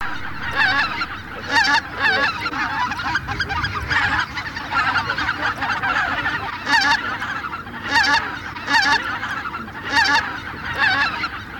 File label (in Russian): Белолобый базар Oche Lombardelle; plurifon